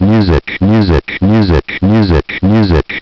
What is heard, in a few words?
MUSIC; Cornwall